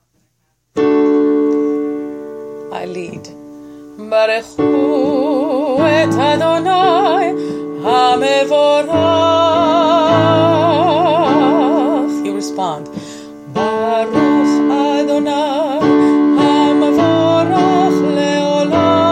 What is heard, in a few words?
Barchu